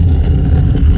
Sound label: stn door; Revista CD Expert 42; Grounch